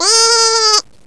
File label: sheep